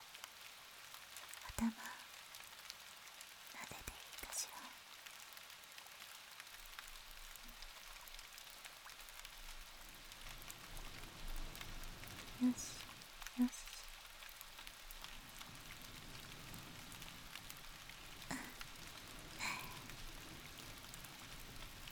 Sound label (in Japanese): ぐっすりパート耳かき; ネクロマンサー2